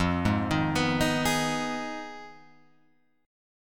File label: F Minor 7th Flat 5th, Guitar, Open F; Fm7b5; arpeggiated